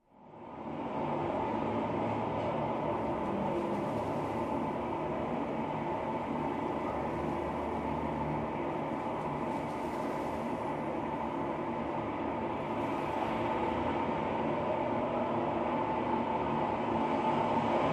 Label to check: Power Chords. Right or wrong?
Wrong